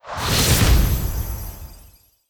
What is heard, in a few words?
vine respin